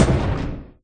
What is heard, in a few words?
brick special explosion; candy crush saga online